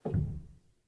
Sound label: rel, Grand Piano; bitKlavier Grand; Piano Bar